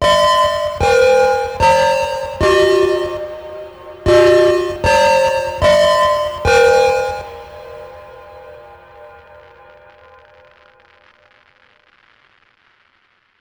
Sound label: chime horror; 主播女孩重度依赖